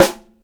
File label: SD HIGH, snare drum; SNAREDRUMS